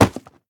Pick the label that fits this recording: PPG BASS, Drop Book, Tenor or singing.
Drop Book